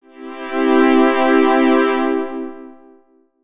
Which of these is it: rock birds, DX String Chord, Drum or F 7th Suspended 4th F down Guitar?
DX String Chord